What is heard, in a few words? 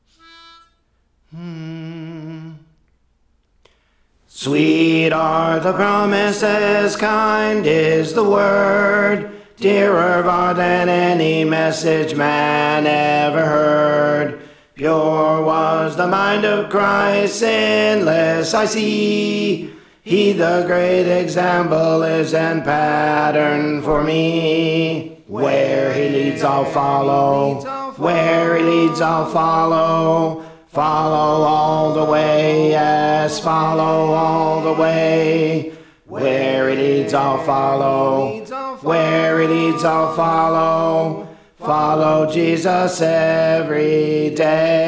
Alto; Praise For The Lord Training